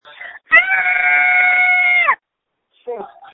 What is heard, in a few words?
Scream